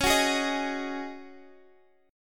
C sharp Suspended 2nd Suspended 4th, Guitar, Standard; strummed; x,16,16,13,16,x; capo=2